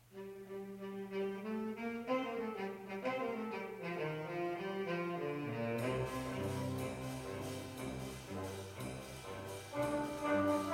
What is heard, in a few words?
waltz